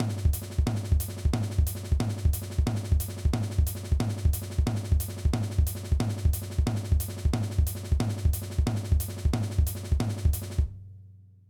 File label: Chop